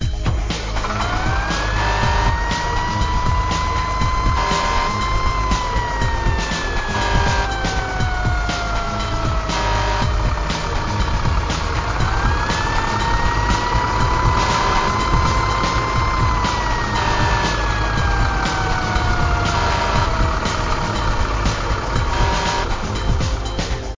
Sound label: Motivational - Firetruck Takeoff